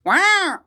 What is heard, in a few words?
Mushroom Roller Startle